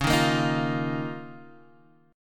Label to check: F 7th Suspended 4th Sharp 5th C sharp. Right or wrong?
Right